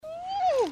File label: woooooooi